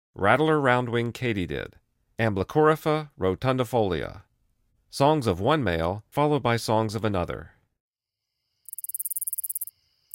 Rattler Round-winged Katydid; The Songs of Insects

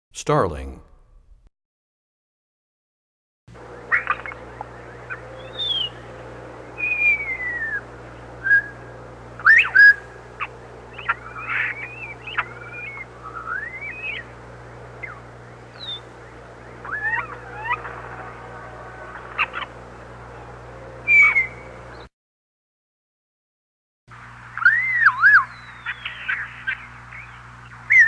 Starling, Bird Songs; Animals; 101BirdSongs

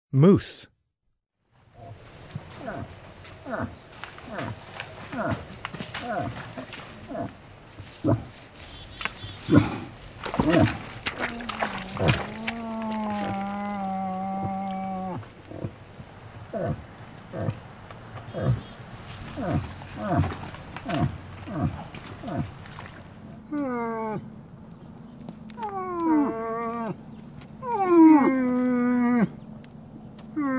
Moose, Wildlife Sounds

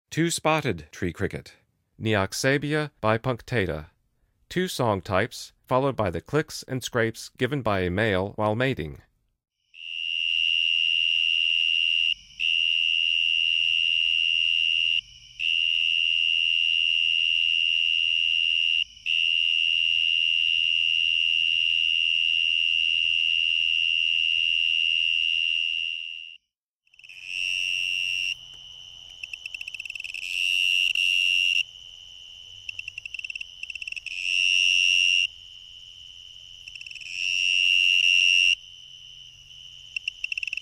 Two-spotted Tree Cricket, Insects; The Songs of Insects

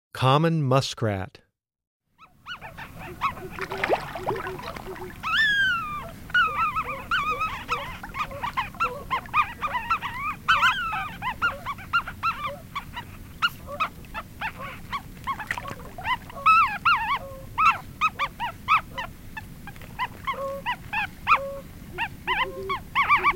Muskrat, Wildlife Sounds

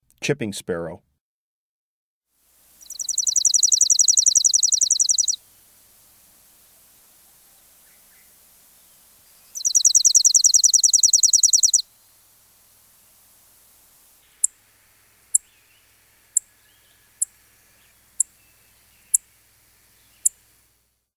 Chipping Sparrow; Breeding Birds of the Chicago Region

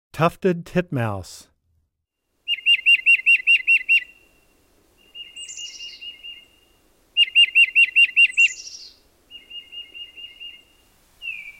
Tufted titmouse, Wildlife Sounds

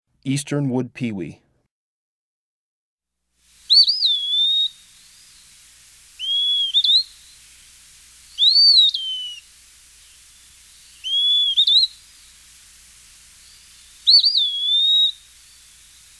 Eastern Wood Pewee; Breeding Birds of the Chicago Region

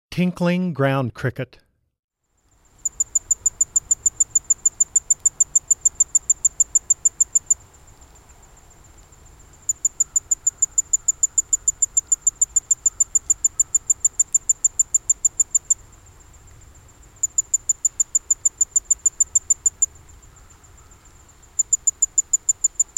Tinkling Ground Cricket

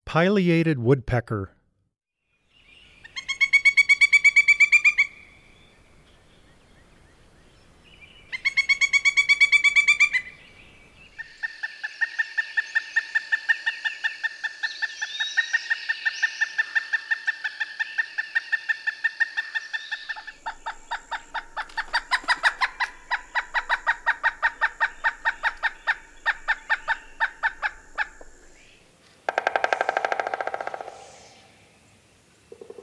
Pileated Woodpecker, Wildlife Sounds

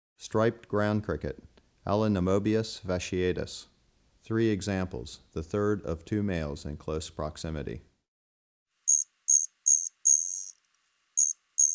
Striped Ground Cricket, Allonemobius fasciatus; Crickets and Katydids

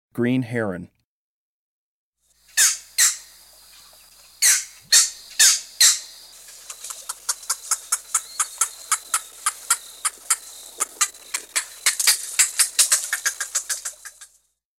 Green Heron, Birds of the Chicago Region

